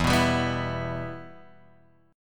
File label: E Minor E down Guitar Drop D